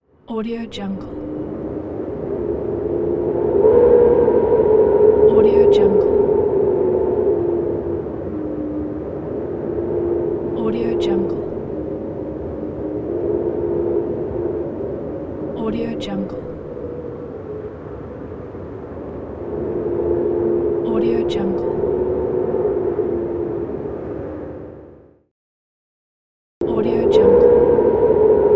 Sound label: wind noise